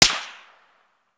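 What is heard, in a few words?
supersonic snap, bullet snap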